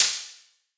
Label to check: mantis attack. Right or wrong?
Wrong